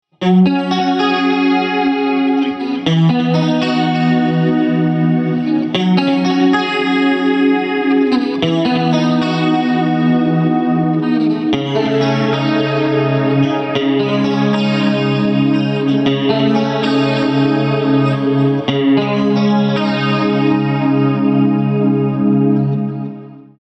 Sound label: 80s chorus